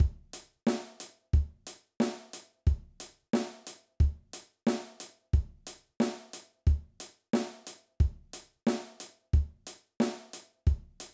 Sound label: Basic Drum Beat